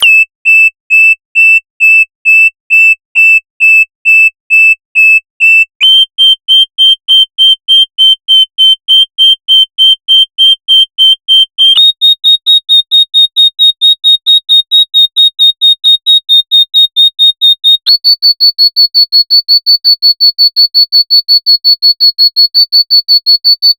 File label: Alarm sound